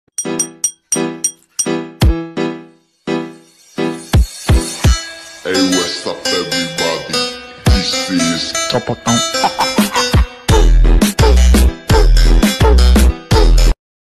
new music tanze khandedar

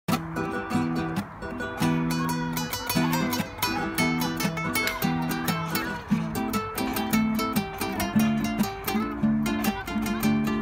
music afghani sedaye bache remix

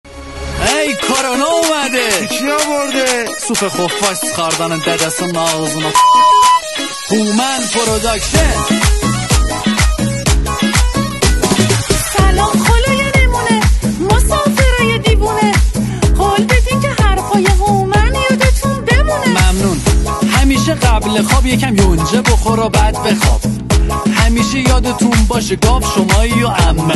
new music tanze khandedar

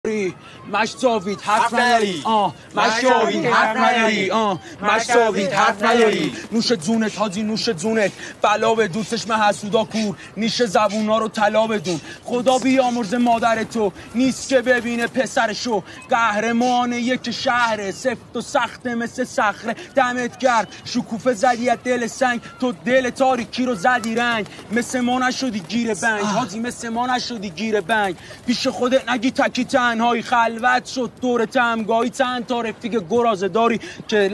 rap

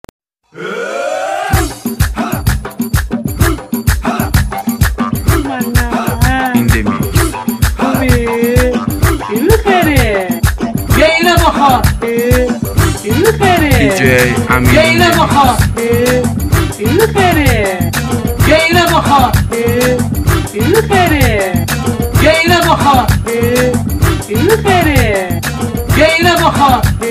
new music tanze khandedar remix

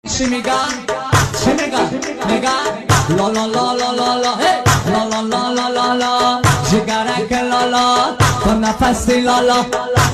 new music tanze khandedar

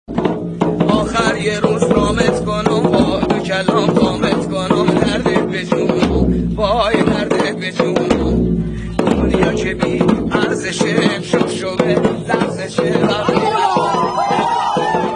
music lori laki kohdashti

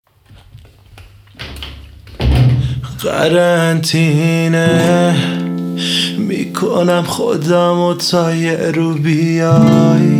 music kordi ghadimi

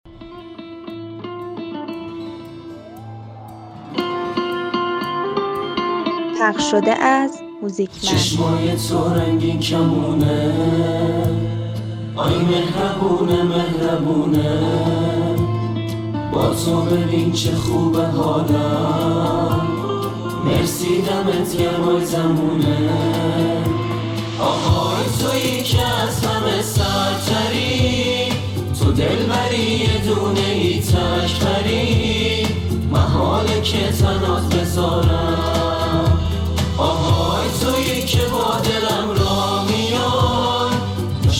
music hamkhani gorohi

